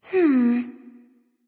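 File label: thinking, nonverbal; smite; voicepack; khepri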